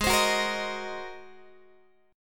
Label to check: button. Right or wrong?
Wrong